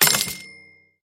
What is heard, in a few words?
purchase; retro-bowl